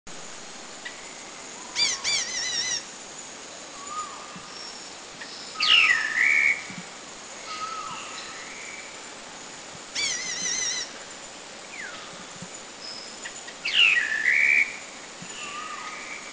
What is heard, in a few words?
Philippine Bulbul Subic; Philippines